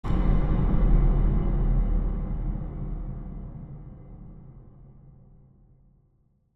long metal, High Impact; 3maze

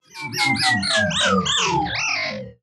Movement; 99Sounds; InterSpace

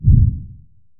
low Frequency explosion; Kenney's Sound Pack; Sci-Fi Sounds

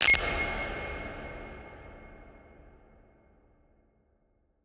Digital-Horror - BOOM - GlitchBoom; Yakumokobe; Digital Horror Full Pack

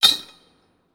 Metal Pipe; 99Sounds; The Warehouse

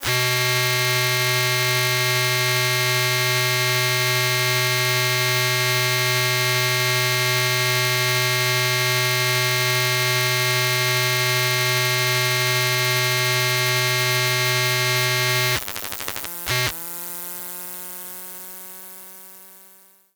Cnc Wood Carving Machine, Electromagnetic Fields; Soundbox Library; Electromagnetic Fields Collection

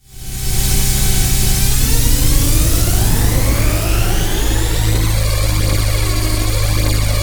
Vehicle Engine Start Up Med, Sci-fi Flyby; David Dumais Audio; Sci-fi Flyby Sfx Pack

